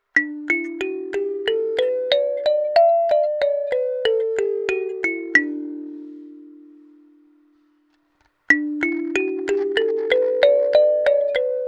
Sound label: Kalimba RAW PIEZO